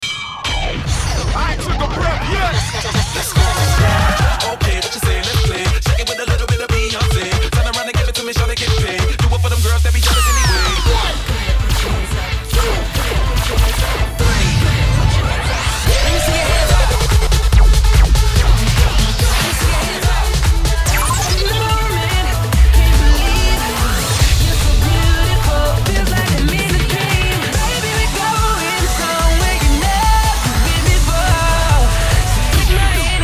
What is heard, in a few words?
after mastering cheer mix